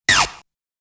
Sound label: Enemy Attack Sound; Saint Dragon